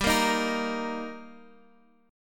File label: G Minor Sharp 5th G down Guitar Half Step Down